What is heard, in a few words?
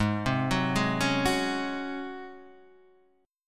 G sharp 11th G sharp, down, Guitar, Half Step Down